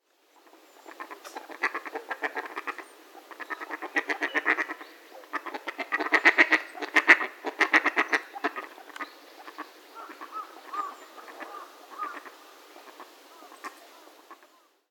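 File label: Mallard; マガモ; 雌？; 埼玉県吉見; yoshimi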